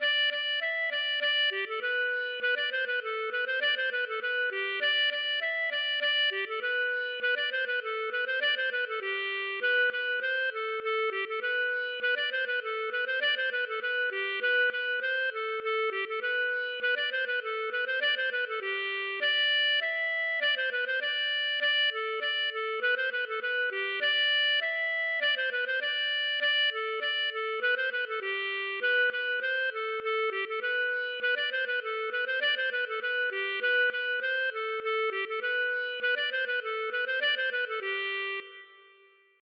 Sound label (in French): aeroplane; Erquy